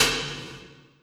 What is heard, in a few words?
rim